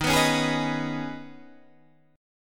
D sharp 7th Suspended 4th Sharp 5th, Guitar, Half Step Down